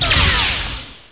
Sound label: RIFLE; MPG-Net (USA); imperium